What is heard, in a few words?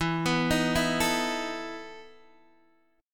E Major 7th Suspended 4th E down Guitar DADGAD